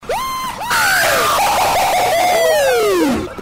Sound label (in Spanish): risada; Memes